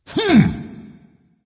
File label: confused dragon; fafnir